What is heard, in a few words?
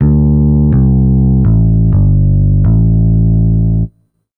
DEEPHOUSE BASS 125 A